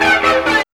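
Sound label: synth riff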